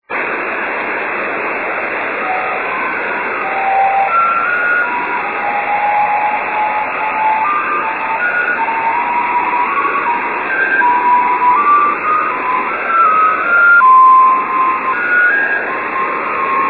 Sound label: 5G jt65c; ドプラー音; 晴、気温12℃ 湿度60%; ビル反射&機体反射; ANT:45cm offset dish; WSJT JT65C; JH1UGF 横浜市; JN1AYV 茅ヶ崎市